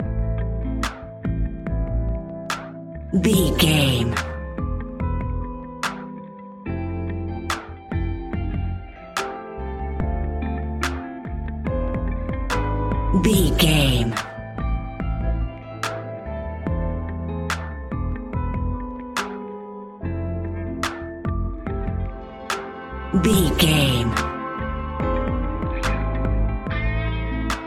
A classic piece of Hip Hop and Rap music

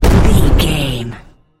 explosion large

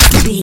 gun silenced rifle 3 shot

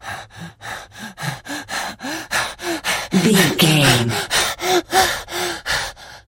voice male breathing sequence long

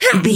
voice female attack

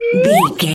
whistle slide up

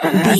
voice female effort tired

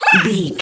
goblin fairy attack

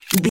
gun pistol insert mag

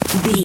footstep snow walk

